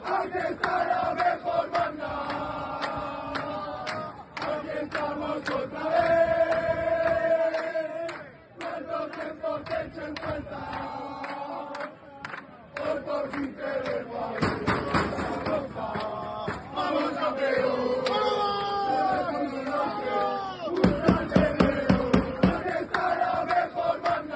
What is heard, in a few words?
fanchants; aqui esta la mejor banda